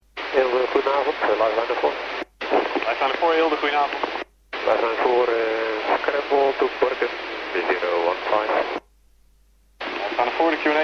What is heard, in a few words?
lifeliner airborne; umcg for a mission to borkum germany